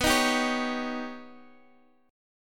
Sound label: D sharp 7th B, Guitar; D#7/B Chord {X X 9 8 8 9} strummed